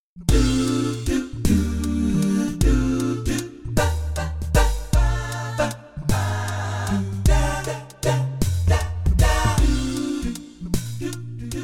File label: smooth